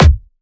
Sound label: Kick, dubstep; Demolition - Dubstep Samples; Drum Shots; Apocalyptic Kick